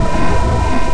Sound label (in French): Fantome